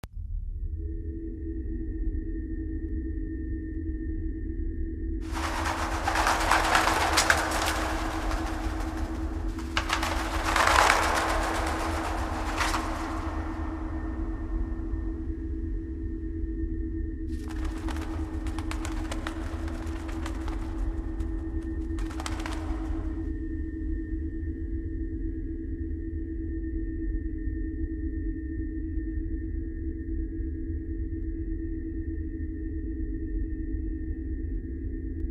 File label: Amb Church Int